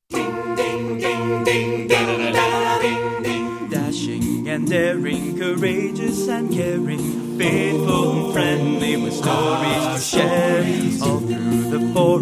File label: g theme acapella; brown university derbies